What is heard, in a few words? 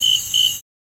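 Crickets Single Chirp Background Ambience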